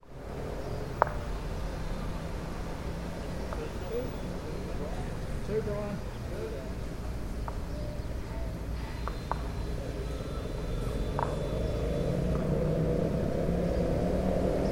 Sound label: bowls in Southend on Sea